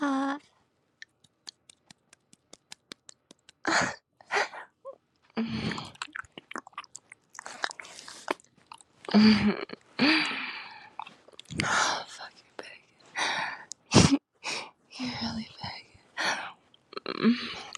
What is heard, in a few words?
choking, light talking; F4M; being your throat toy